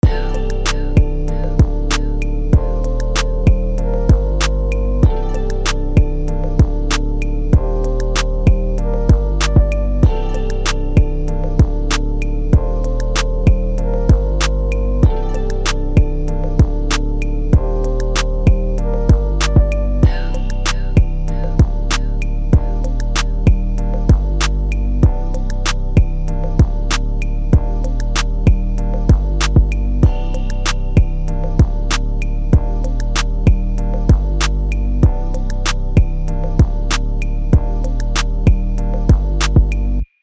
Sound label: Loop, melody loops; whoonthetrack palm tree